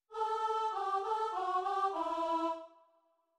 long runs, no voice

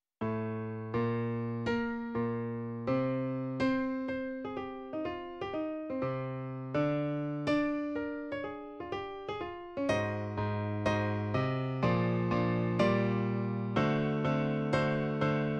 octaves no voice

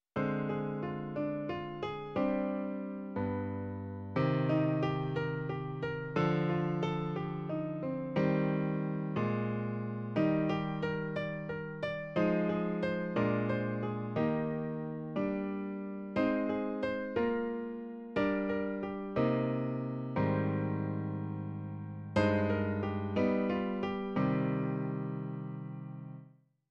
more thirds no voice

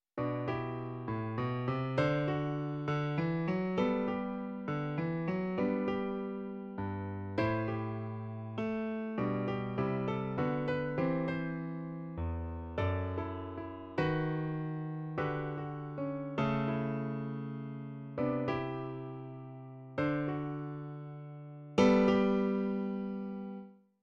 more fourths, no voice

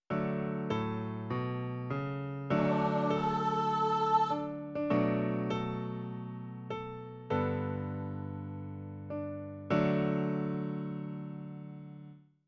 fifths novoice

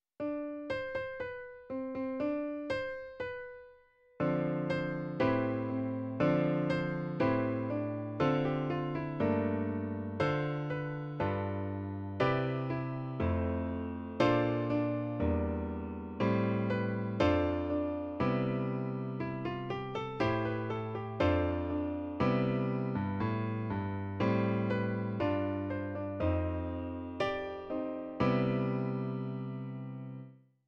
sevenths, no voice

